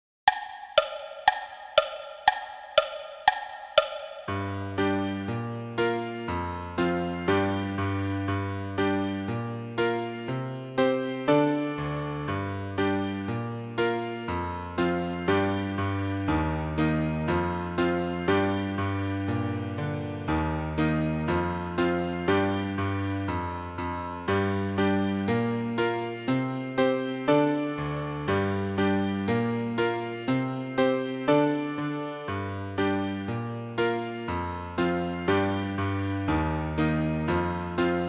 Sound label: Celtic Pop Song countoff